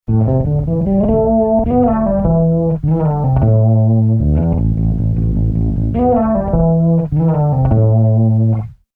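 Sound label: Fretless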